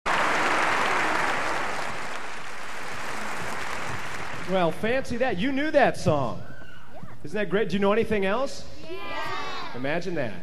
Introduces Kids Choir; 1989 christmas show

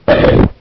CHOMP

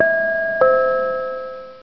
DING DONG, bells

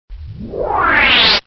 WHOOSH

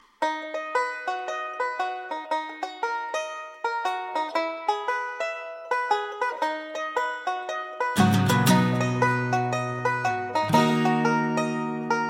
folk rock banjo

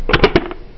HOLLOW B, clicks

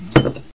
SIT GLAS, house

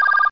intercom; alarms

